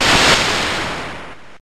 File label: Electric Terrain; pokemon reborn e19 2022